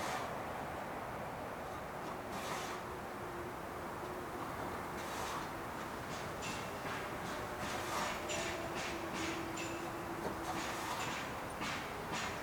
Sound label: village, ambience; pf2e; hellbreakers